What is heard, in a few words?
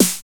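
snare 909; Best Service Gigapack 2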